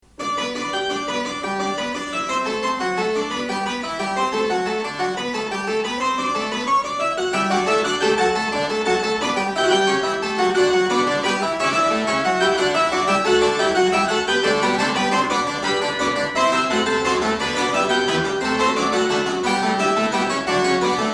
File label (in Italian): fuga; bach; bwv884